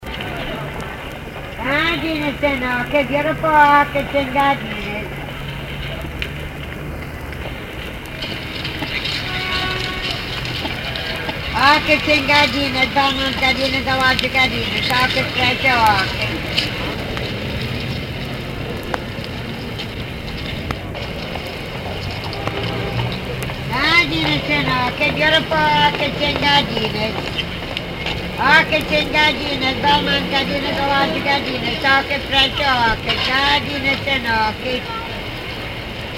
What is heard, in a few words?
folkways sound patterns